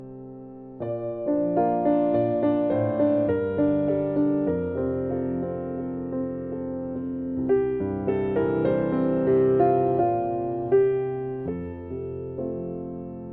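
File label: piano instrumental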